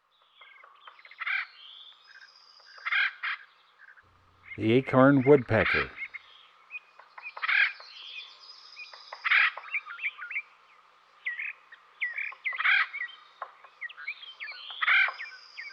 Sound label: Acorn Woodpecker; Western North America; Dan Gibson; Wildlife Identification By Sound